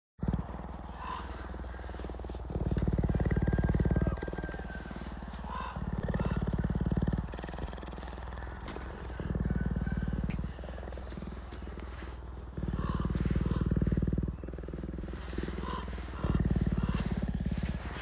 Cougar Purr; Cougar Purring

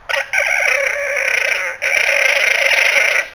Upset Alpaca

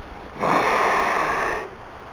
cougar hiss

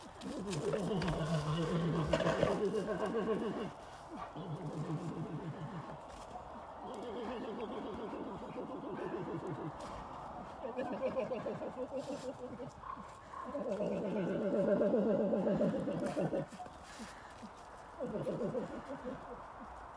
Black Bear

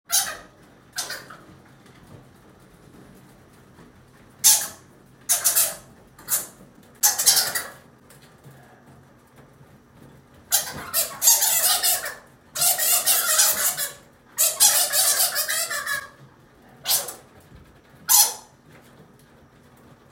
Fruit Bats, Egyptian Fruit Bats

